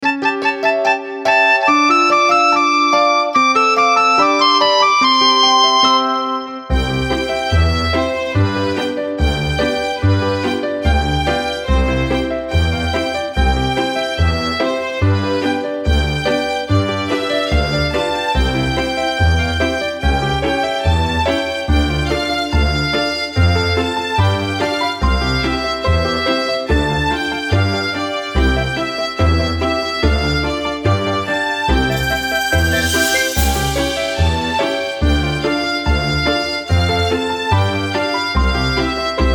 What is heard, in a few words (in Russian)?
karaoke